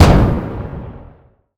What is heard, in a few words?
gunshot